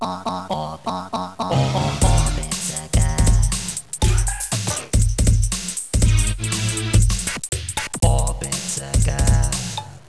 techno

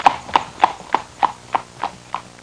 horse trotting; farm animals; Sound Effects for Multimedia - Volume 1

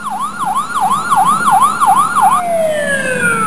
siren doppler

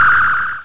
Phaser; nettrek

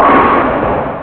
One pin; Alley 19 v1.11

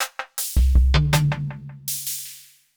PERCUSSN, Ambient, Drum

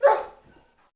K9 single Bark; Holiday Software Sampler (Sierra On-Line) (1998); SWAT2DEMO

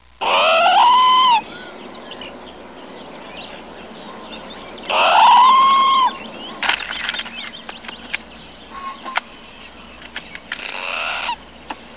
Birds and Squirrels; Soundsation; Animals

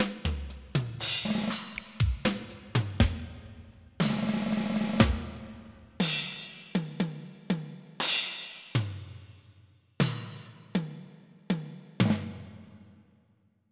Acoustic Kits; Onj's Soundfont

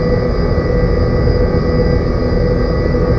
engine; imp

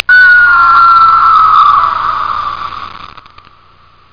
EAGLE

